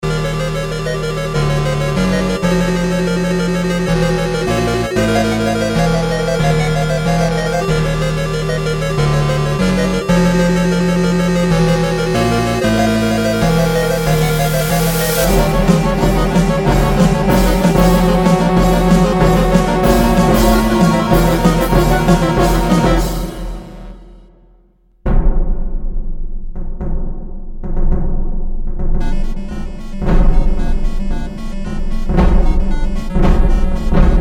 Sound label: haste bass; BOWSER